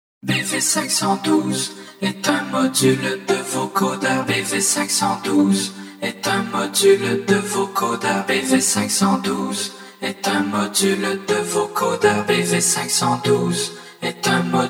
vocoder; vocoder sa voix sur un bpm donne

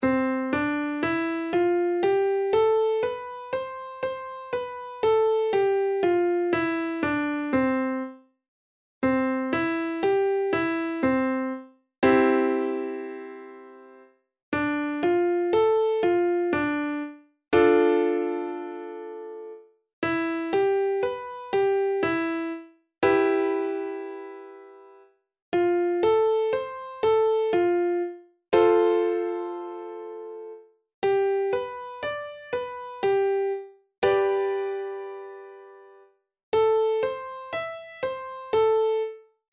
gamme 7 accords; tonalite majeure

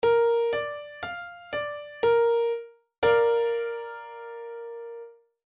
degré VIIm2, accords degrés tonalité mineure

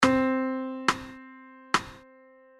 blanche pointee, la rythmique

